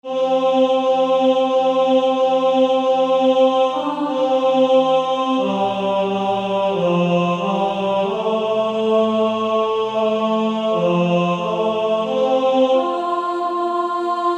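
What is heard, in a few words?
Male Choir, tenor; Onward Christian Soldiers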